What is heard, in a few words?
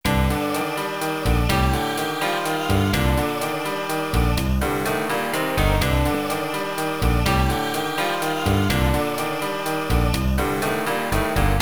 BGM Swamp, Underground Waterway; BGM2 ~Swamp, Underground Waterway~; Dungeons & Dragons Collection; Saturn